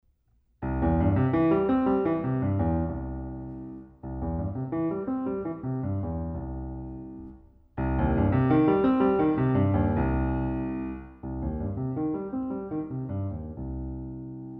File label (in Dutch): Gebroken akkoorden over 2 octaven LH